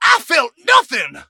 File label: hurt vo; buster